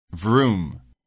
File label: vroom